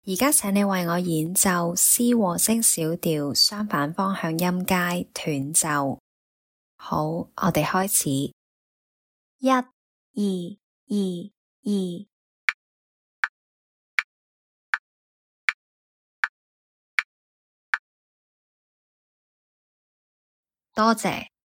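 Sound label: c min harmonic cont mot scale staccato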